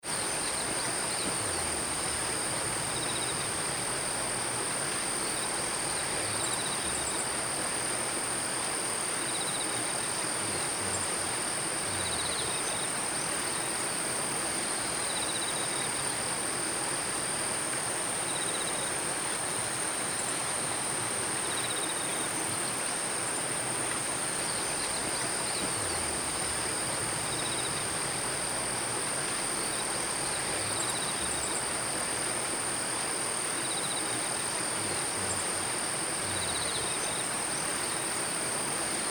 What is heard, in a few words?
rice field